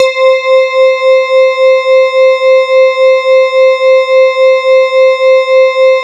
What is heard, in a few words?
E-HARP, DX HARP; Wizoo - Powered DX